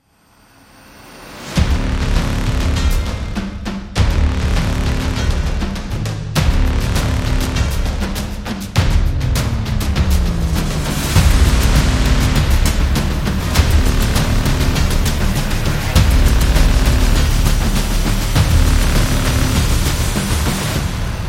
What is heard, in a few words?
Trailer Drums; battle drums